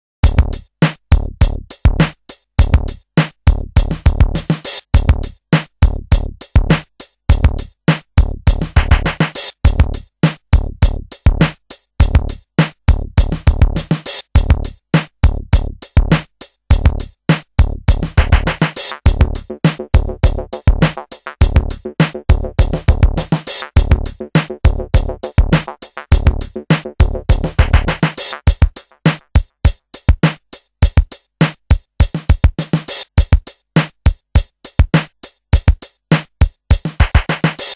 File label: DMX jam